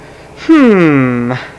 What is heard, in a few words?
hmmmmm; People; Sound Library 2000; Wayzata Technology